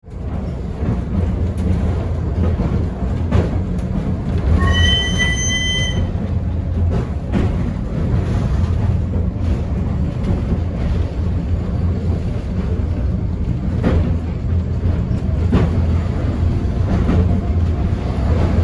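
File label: tram funicular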